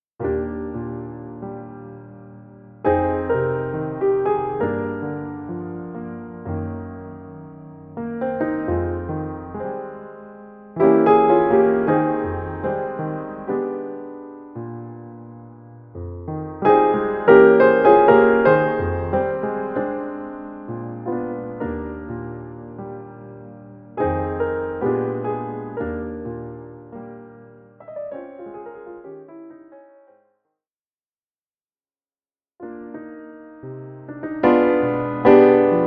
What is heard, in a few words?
Solo Piano